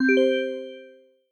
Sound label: chat start